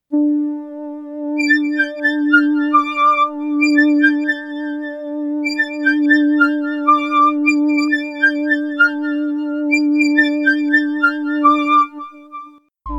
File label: casio vz dark energy combi